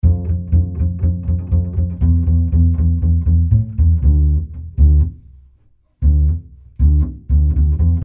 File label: Double Bass, 120 D